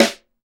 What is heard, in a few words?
SD, KIT Real-Kit; 90 s Sample CDs; Sound & Vision - Gigapack I CD 1 (Roland); Real-Kit m 8